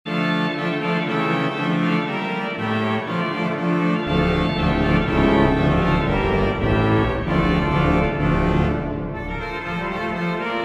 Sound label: Messe Noel, OrganMusic; Fessy & Mine; Souvigny